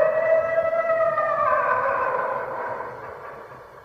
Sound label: howl